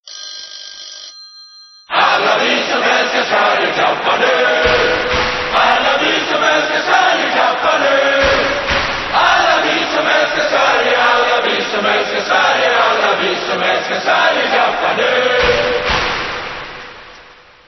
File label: Football Supporter